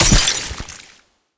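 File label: hit liquid water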